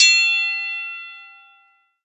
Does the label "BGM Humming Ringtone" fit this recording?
No